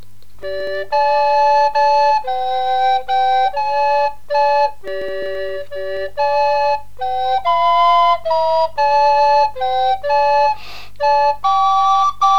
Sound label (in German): floeten so treiben wir